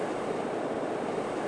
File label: loop propane